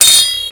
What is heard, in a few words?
SW HIT, MORESWORD; Total Control - Action Cutz